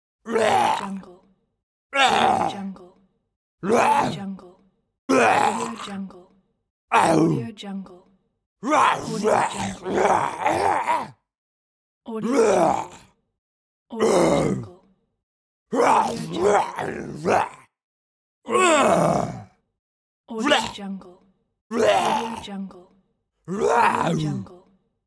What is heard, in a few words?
zombie attack